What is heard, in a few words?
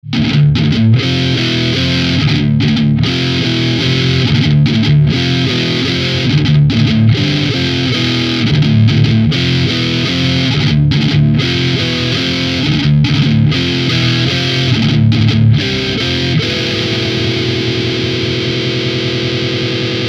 riffs; Schecter EMG